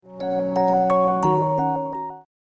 Lovely Bell

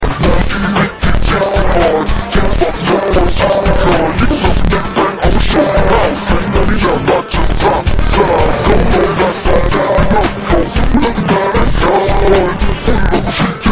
Rap

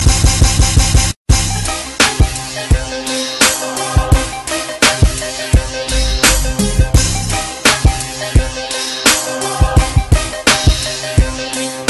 Cool Beat

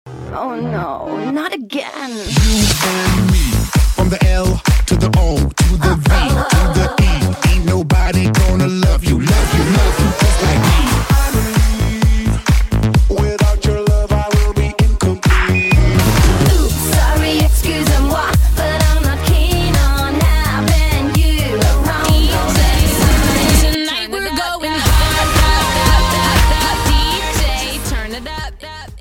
Beat

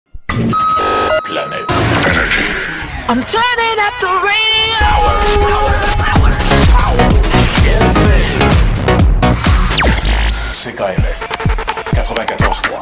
Radio Remix

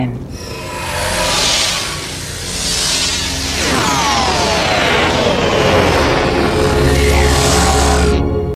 Phone Line; Matrix

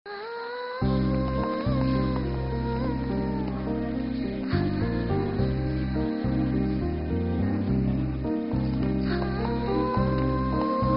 Bgm; Sachein

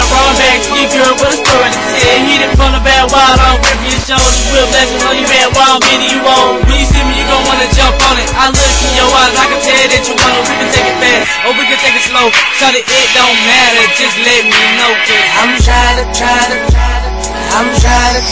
Scream

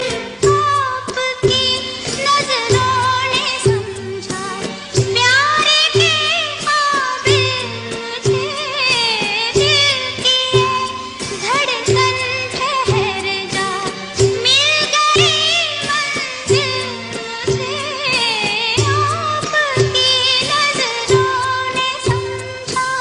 Old Song

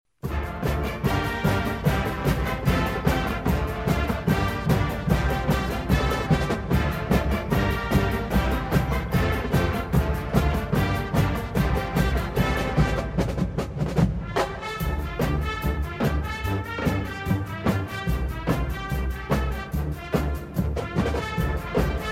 Marching

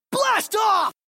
blast off

